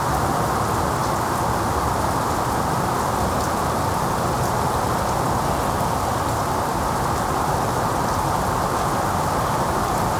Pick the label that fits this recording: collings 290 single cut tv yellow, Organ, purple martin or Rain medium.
Rain medium